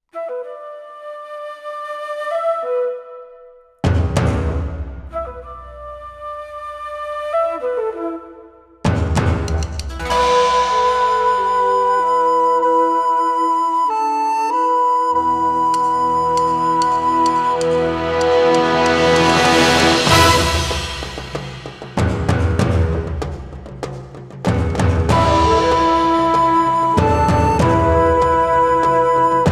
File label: recorder